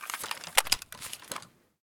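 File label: l85 grenade launcher deactivate, weapons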